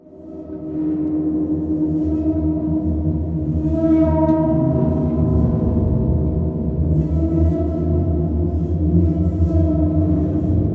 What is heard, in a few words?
Moan; Silent Hill Downpour